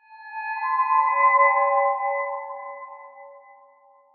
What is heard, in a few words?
ambient abstract metal evo; Half Life Model Pack